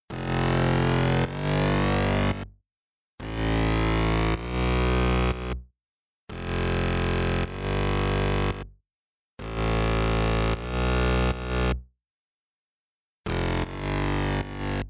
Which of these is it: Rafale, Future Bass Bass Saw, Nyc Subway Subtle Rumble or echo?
Future Bass Bass Saw